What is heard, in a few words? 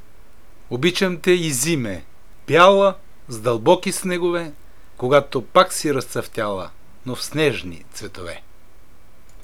kuplet